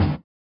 SWUSH; Spectrasonics; Metamorphosis

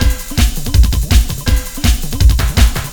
LOOP, Eurotech; Sounds Good

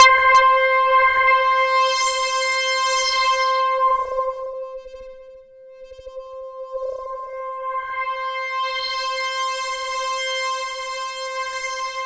C5, SynthLine; 90 s Sample CDs; NiceBeats; SynthLine VOL-1

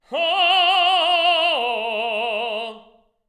LYRICAL; Spectrasonics; Symphony of Voices

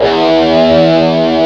guitar E2; AKAI S-Series CD-ROM Sound Library VOL-8; SET#1 GUITAR

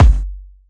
Kik; Rawcutz - Nu Forms

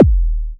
2600 KICK, Techno Trance; E-MU Formula 4000 Series Vol. 2; OrbitPercMaster

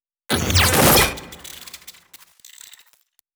snare thing

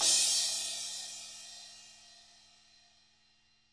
CRMA18, Drums, TAMA; ILIO; Double Platinum Drums 1; TAMA+TAMA

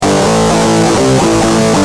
Guitar, Industrial

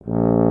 BRS TUBA, Solo Instruments

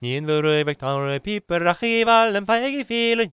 GAELIC, World Voices; ILIO; Vocal Planet

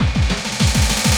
loop, 200 BPM; Ueberschall; Drum & Bass Resonance

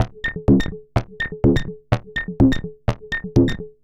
perc 125, Percussive Loops 125 bpm; bullion

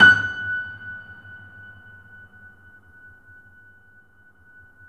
F#, piano sustain

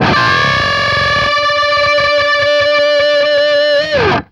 DBLE D 4 CUT, guitar doubles; East West; Steve Stevens Guitar Samples Collection

